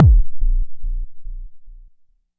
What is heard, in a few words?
Jomox MBase, drum machine; Jomox MBase 01